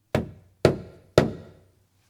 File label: Knocking To Gate